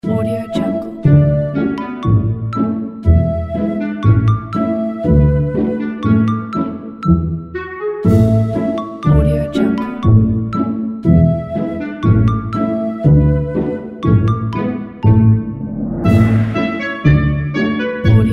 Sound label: light family comedy